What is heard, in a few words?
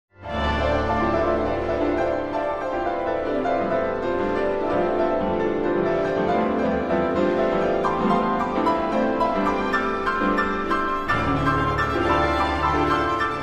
Piano Concerto; mvt1